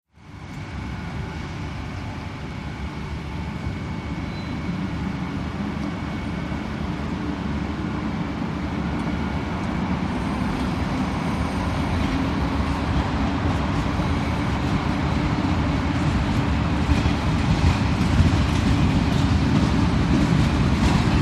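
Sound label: Commuter Train Pulls Out; train station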